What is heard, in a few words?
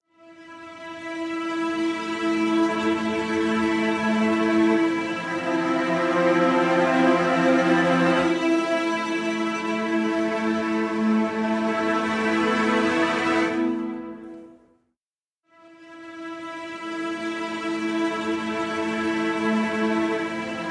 String Ensemble Micro Spreading Stereo; MicroPitch Immersive